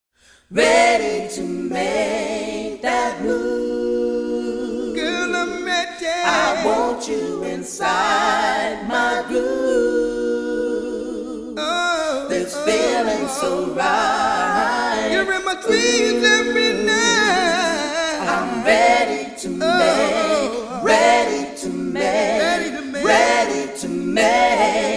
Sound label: Vocal Group Vintage Plate